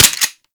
Fire Mech, Weapons; Warframe; Boar

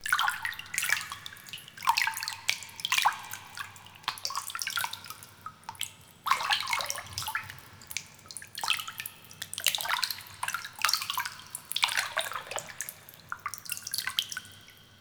Close Water Laps, ambience; Soul Plane

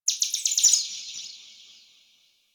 Forest Bird High; Ambience; Warframe; Grineer Forest

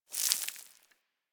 Settlement Sand Fine Debris; Ambience; Warframe; Grineer Settlement

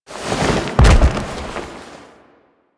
Foley Bodyfall; Cinematics; Tenno; Warframe; The New War; Throne Room

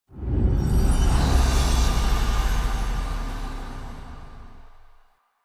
Fade To Black Stinger; Warframe; Jade Shadows; Quests

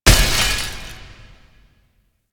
Crystal Damage; Warframe; Veilbreaker; Devourer Kahl

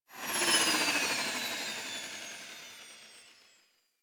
Arch Wing Movement Wind Down, Vehicles; Warframe

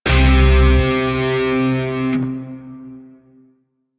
Grineer Shawzin Hirajoshi Chord L; Music; DE; Warframe

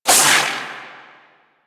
Void Projectile; Weapons; Warframe; Duviri; Incarnon Adapters

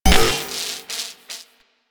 Electro Mag Charge Up End, Weapons; Warframe; Corpus

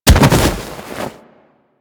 Hoverboard Ragdoll Bodyfall; Warframe; DE; Vehicles; Venus; Hit Effects

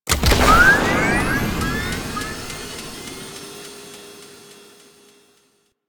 Skiff Engine Boost Medium; Vehicles; Warframe; Grineer Skiff Player Vehicle

